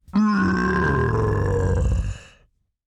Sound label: ogre death; The Monster Plane; kolmods death